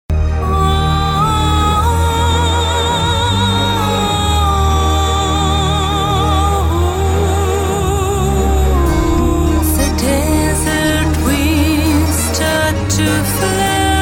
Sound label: Desert Winds